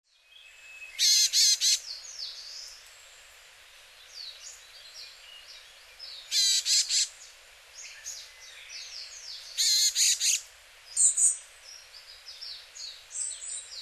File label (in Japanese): yamagara, hyogo